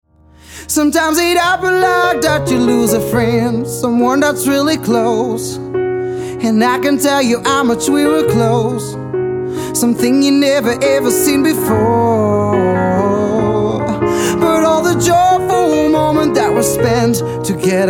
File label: Souvenirs Acoustic